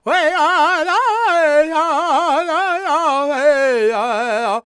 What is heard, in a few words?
World Voices, Lakota; Spectrasonics Vocal Planet CD5